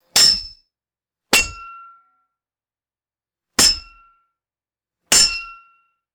hard metallic aluminum tube strikes

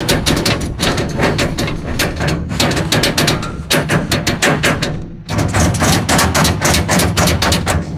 bursts of metallic turret rattling and clicking; aircraft

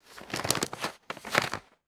thick plastic bag rustling and dropping

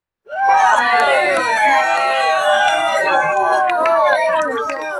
small group of fans cheering and whooping; crowds

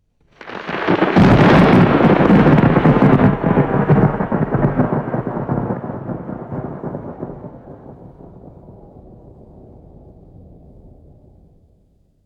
deep rolling thunder clap

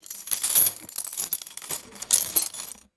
brief metallic chain and hardware rattle; chains

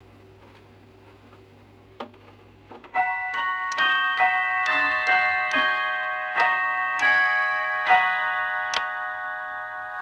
bracket clock quarter hour chime and ticking; clocks

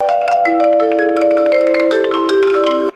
high pitched xylophone trill; cartoon

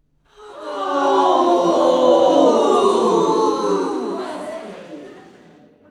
theater audience gasping and saying ooh

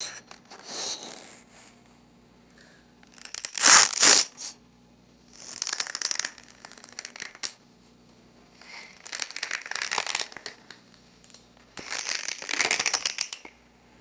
dry toast breaking and crushing; foley

